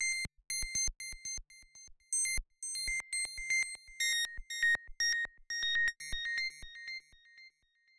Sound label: trickling house synth